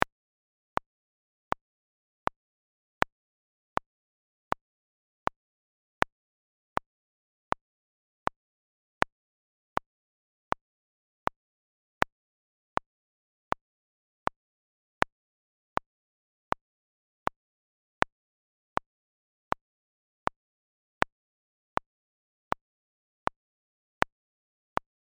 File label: Click Practice, Virtual Handbell